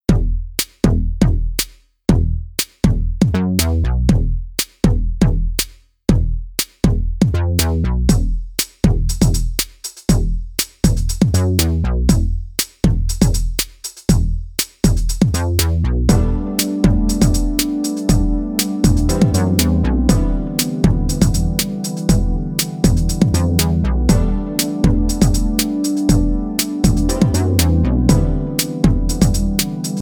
Back Street Beat (bass); NEWS-STRAIGHT NEWS 2

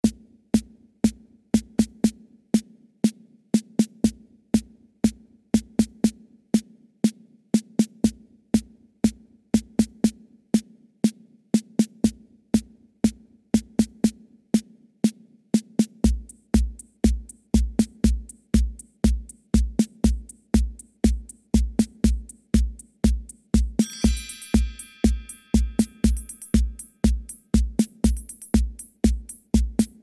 only drum

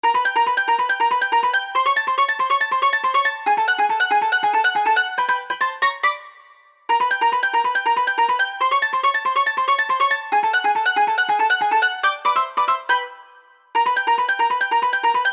only melody

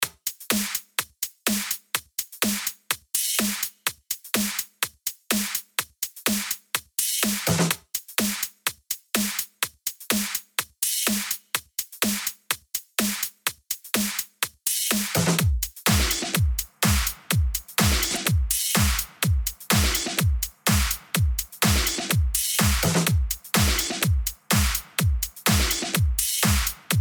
Drum FX

